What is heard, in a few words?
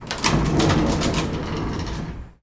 industrial machinery elevator door old open; sound effects one